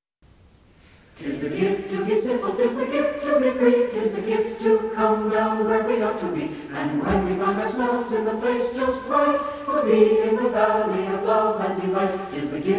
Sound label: Church Choir; Simple Gifts; Plymouth Church Choir